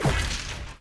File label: coilgun fire